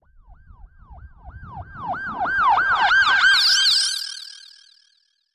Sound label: Filter Proc, alarm siren samples, 120bpm